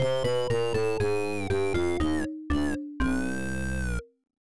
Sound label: Failed; lemmings 3d pc